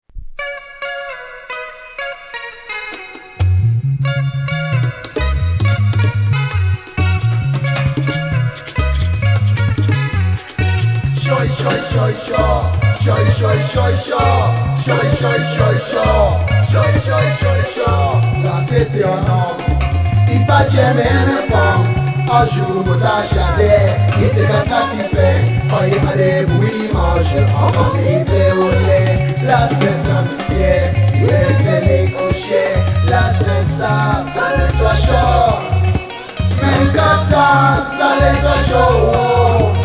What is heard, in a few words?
beat